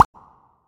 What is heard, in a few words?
Bubble Pop; Nicktoons Nitro; arcade